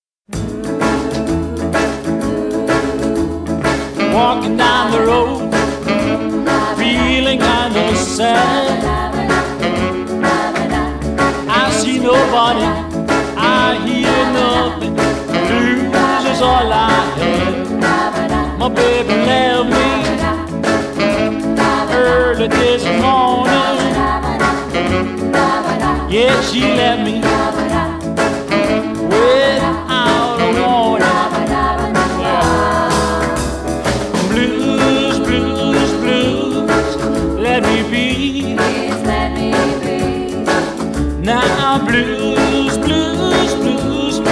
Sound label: blues